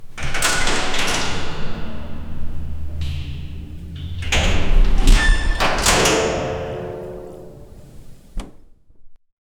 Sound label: a large technological met